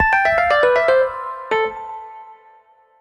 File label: Lose; Pro Wrestler Story (Android, iOS) (gamerip) (2024)